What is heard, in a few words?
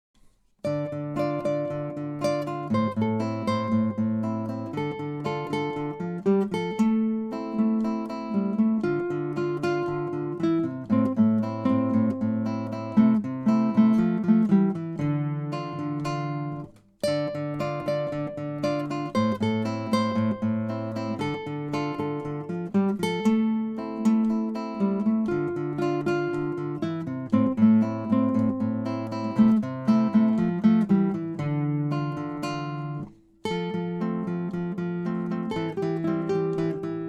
Acoustic Rock duet